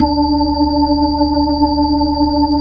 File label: B-3 Chorus